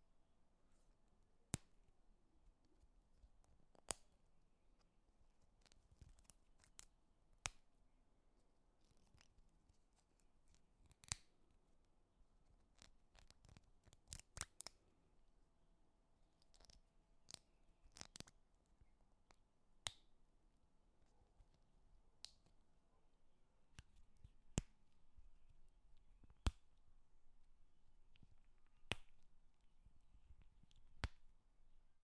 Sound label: Breaking String Thread